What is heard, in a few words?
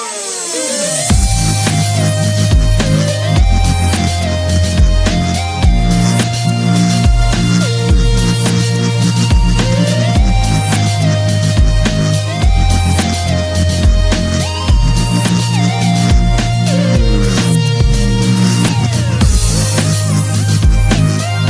Forest Interlude Dub